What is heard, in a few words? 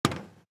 Footstep Metal; Footsteps